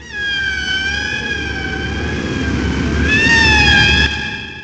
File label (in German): Walgesang